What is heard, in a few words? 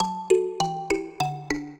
minuet, mbira; Mozart